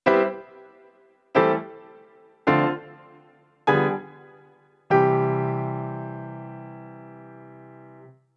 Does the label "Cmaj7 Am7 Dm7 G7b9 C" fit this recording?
Yes